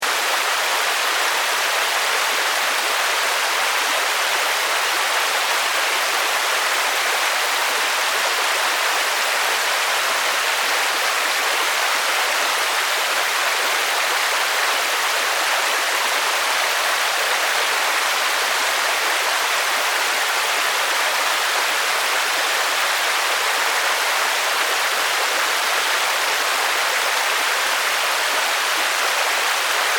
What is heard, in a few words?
Weather Rain Lake Heavy Ambience Loop; SFX Bible